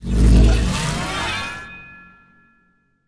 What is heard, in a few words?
On Pull; Ion Fang